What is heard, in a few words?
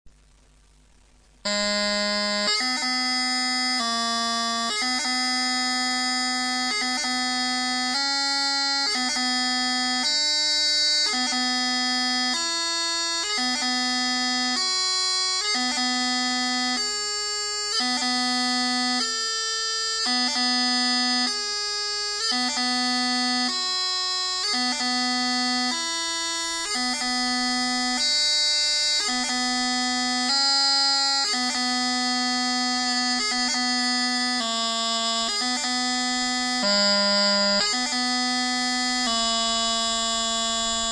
b doublings, bagpipes